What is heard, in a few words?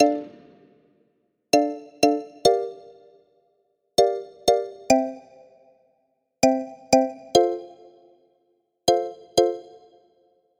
Chords Rhythm Dancehall Afrobeats; midi wizard